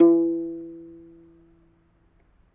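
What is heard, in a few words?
African HARP E 4; AFR. HARP1; Heart of Africa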